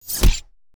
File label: Knife Stabbing; soundscrate